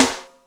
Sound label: Hi5 Rim, Yamaha DTXpress Electric Snare